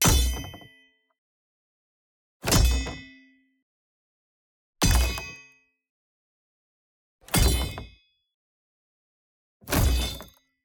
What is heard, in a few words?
Metal Shield Block Hits; Melee Weapons; Justsoundeffects